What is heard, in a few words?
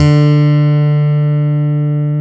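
BS CHAPST, Chapman Stick; Roland L-CD701; Chapmn Stick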